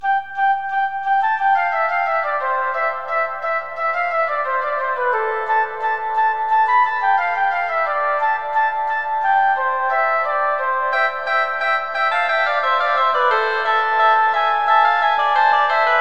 kanon fuer holzblaesersextett partitur

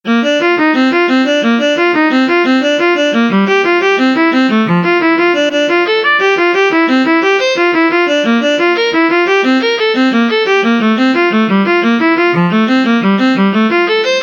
etueden nach js bach viola

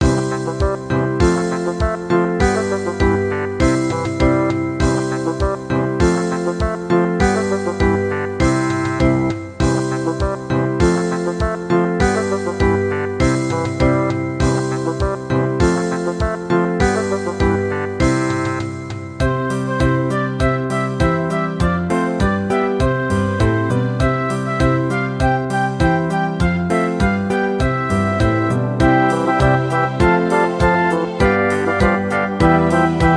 kinderlieder playback

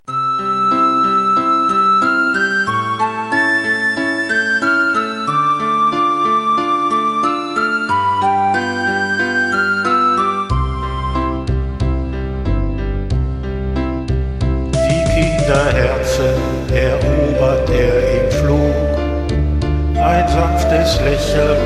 zirkuslieder

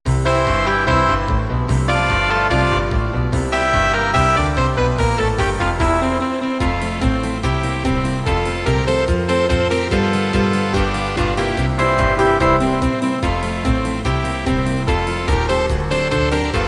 zirkuslieder

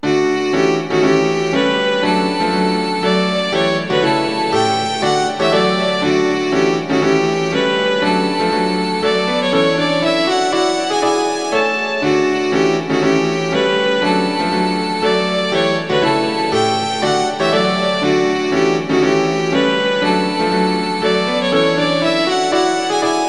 violine u klavier; kinderszenen

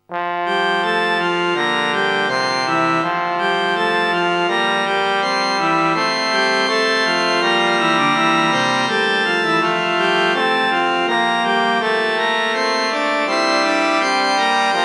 nun danket all und bringet ehr, orgel

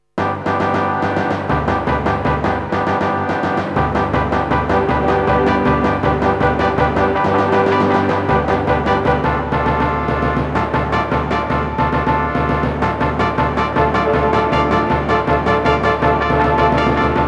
zirkusmusik fanfare; fanfare2004; verk fassung

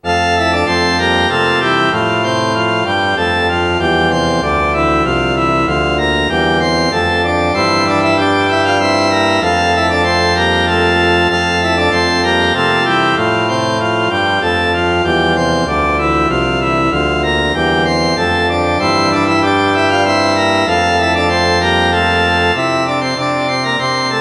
lobe den herren, orgel

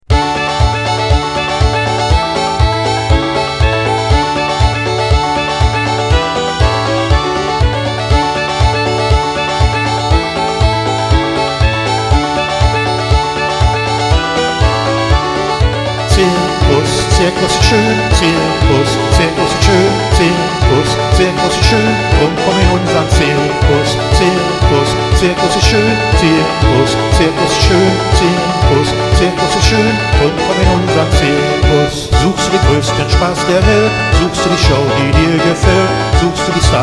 zirkuslieder

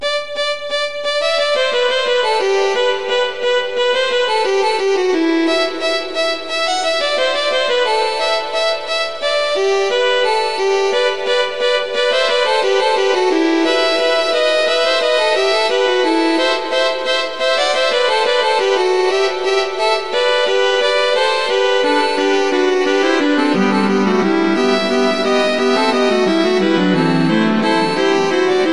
kanon fuer 2 violinen und cello

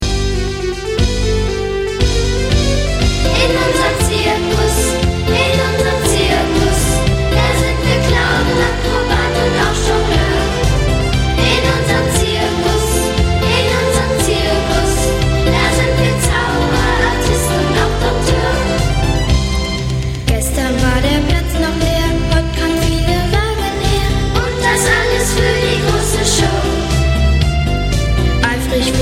zirkuslieder

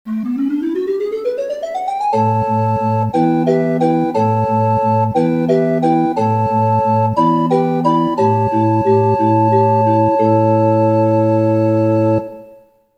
orgelfanfare

